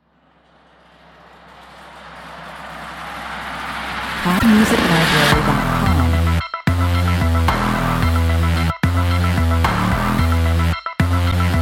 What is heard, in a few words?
machines break the beat (less drums)